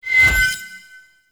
Archon Shard Ephemera Hide, UI

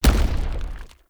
Heavy Melee Charge Step

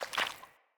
Stuck Sludge Foley Move; Encounters; Animal Rescue

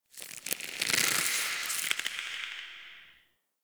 Tendon Stretch Medium Echo; Ambience; Misc Outdoor; Warframe; Infested Eris

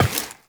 Step Glass, Footsteps, Walk; Warframe; Characters; Tenno

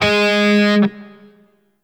Grineer Shawzin Oct Two G Sharp; Warframe

